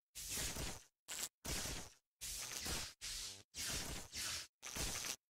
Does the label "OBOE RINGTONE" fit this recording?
No